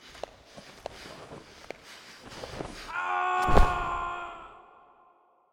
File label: Well